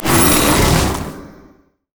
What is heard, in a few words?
sap magic melee heal; maokai